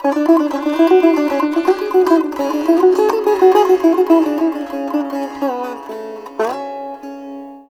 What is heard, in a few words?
SITAR; Spectrasonics; Heart of Asia